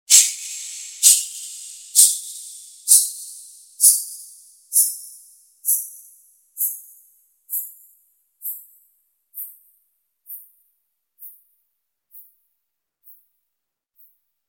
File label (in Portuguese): caixa; ohm force